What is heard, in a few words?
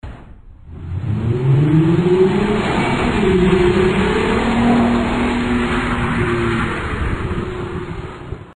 magnaflow exhaust systems